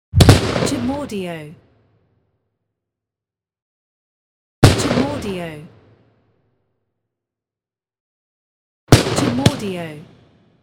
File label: Gun Weapon Shot